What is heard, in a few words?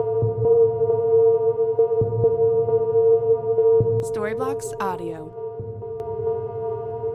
Ambient House Ambience 134BPM F